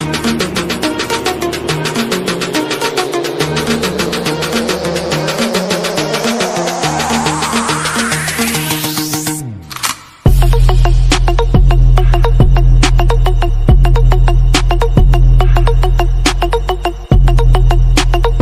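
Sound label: world best instrumental ringtone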